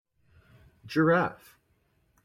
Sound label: giraffe